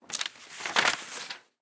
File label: copier remove paper